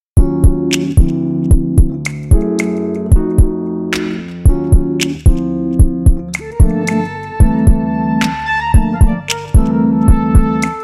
down lounge; jasshouze

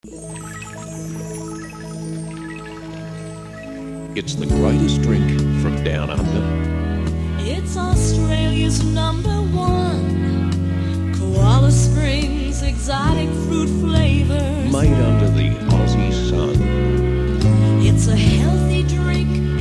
koala springs jingle

